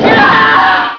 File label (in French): cri; csde rambo halloween